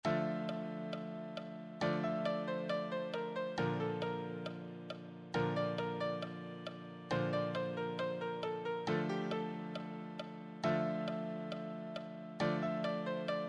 easy solo, piano

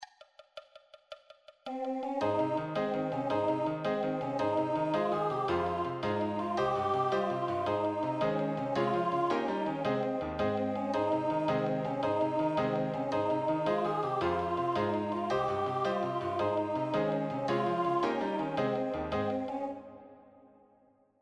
accompaniment, piano

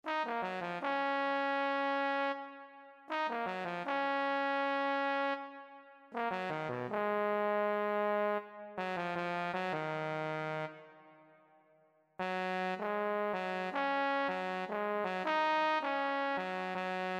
euphonium

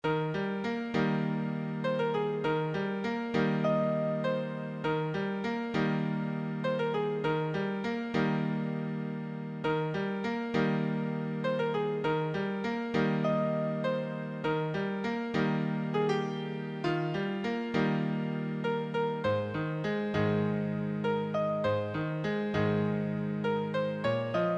solo, piano solo

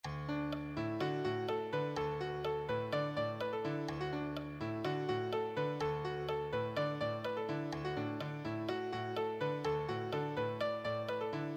accompaniment

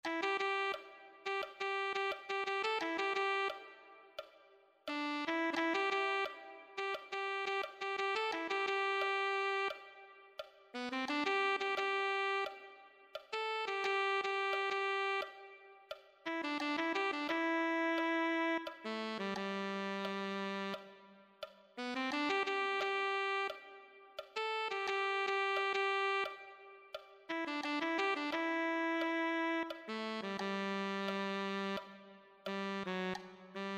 alto saxophone

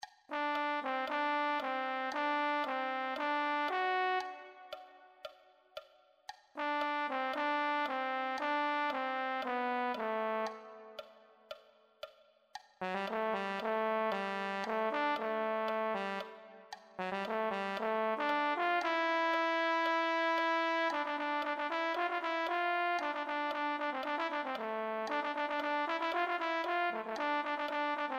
euphonium

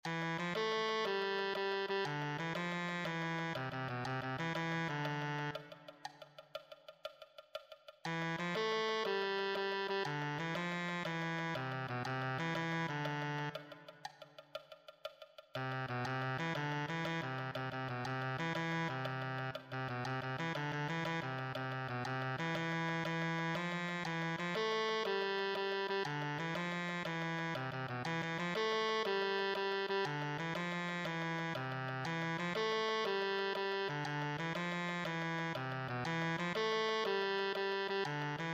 tenor saxophone

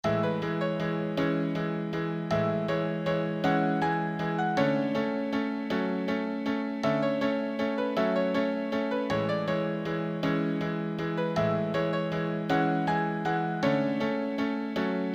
advanced solo, piano

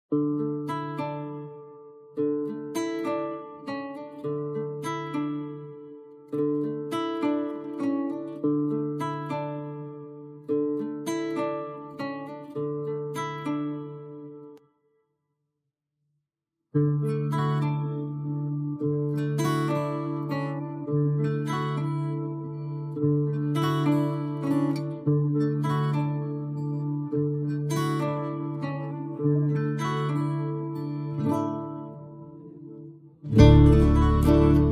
backing track

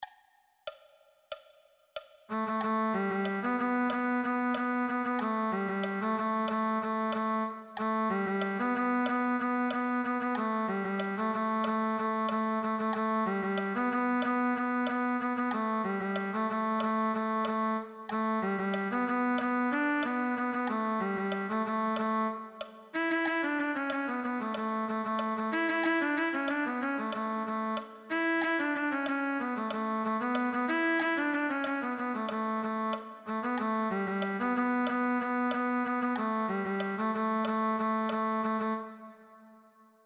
cello

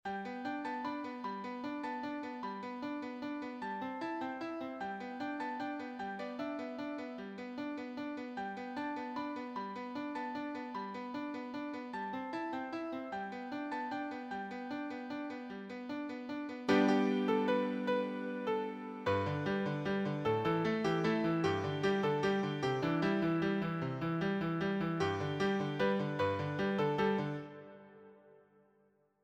solo, piano

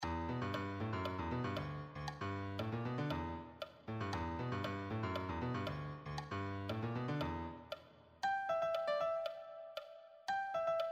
melody, piano

